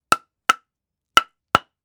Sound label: Hammer hitting wood panel hammering